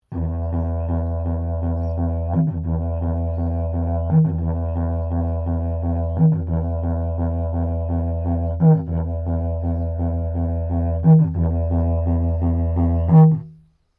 Didgeridoo Traditional Tapered Short Rhythmic Drone, Traditional Australian; Traditional Australian Aborigine didgeridoo designs; Miscellaneous Musical